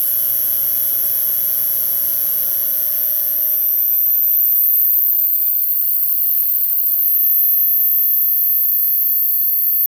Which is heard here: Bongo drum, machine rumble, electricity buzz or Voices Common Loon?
electricity buzz